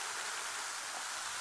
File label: Background Champagne Sound; Pixel Gun 3D